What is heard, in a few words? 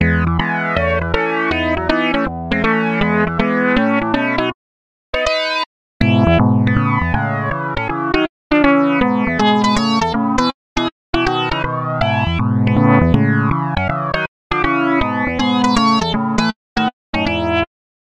LEAD; Phasewaver; Resilient Mind